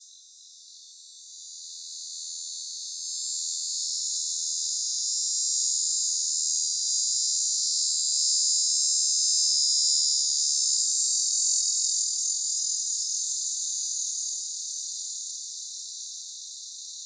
probable Neotibicen davisi harnedi, cicadas songs; US; MS; filtered

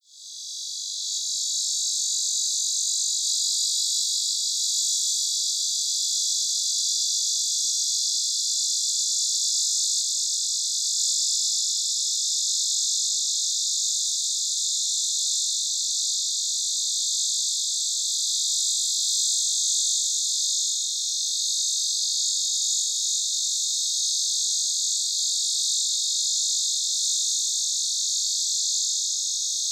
Neotibicen lyricen virescens, cicadas, filtered; US; FL

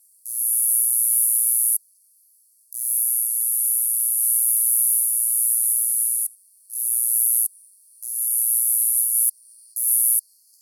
Okanagana synodica, cicadas, filtered; US

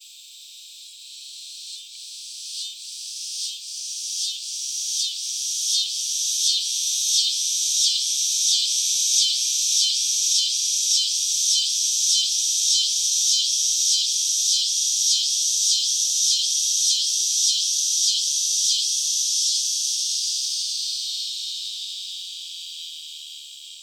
Neotibicen winnemannus, cicadas songs; filtered

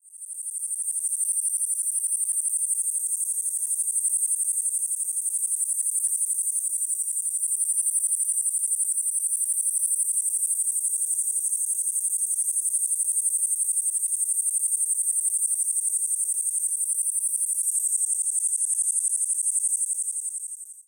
Okanagana utahensis, cicadas songs; US; filtered

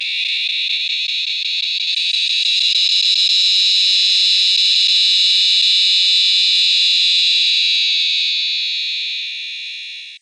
Neotibicen resh, cicadas songs; USA; filtered

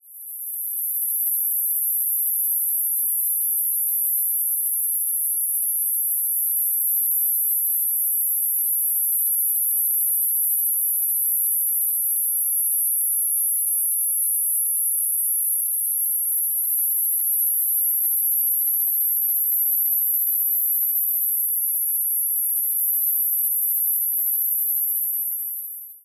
Okanagana rubrovenosa rubida, cicadas songs; US; AZ

